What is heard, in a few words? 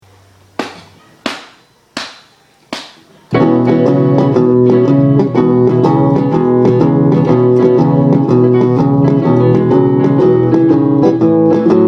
Blues impro